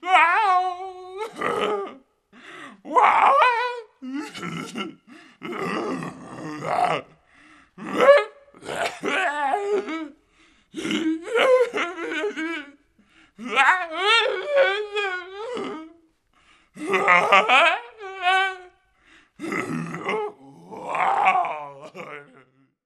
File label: loop; hidungdung